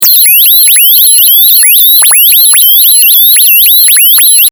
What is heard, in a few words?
fm synths